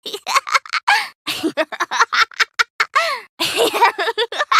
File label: Bad girl laught